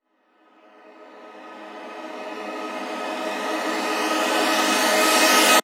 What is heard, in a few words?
FX Misc